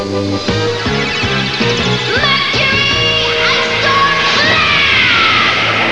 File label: icestorm